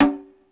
PERC, ETHNIC DRUMS; Crypton - DrumBase5000

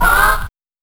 vox wah 12khz; M8; M8JUNGLEPAK1

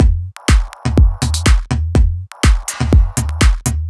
B 123bpm, House Drumloops; Moulin; Zero-G House Fabrik Drumloops

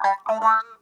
box wah, funk guitar; Zero G - Funk Guitar

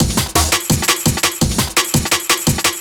Break, Drum N Bass Percussion; Sonic Foundry (Sony Creative Software); Knutrix Drum & Bass; Fills n Breaks

